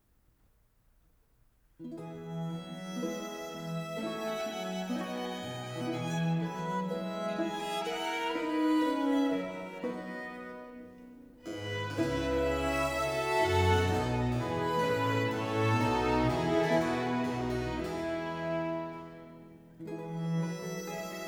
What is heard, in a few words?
Concerto grosso Preludio (Adagio); No. 12 in F Major; Corelli; Concerti grossi, Op. 6